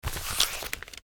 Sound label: move; numerique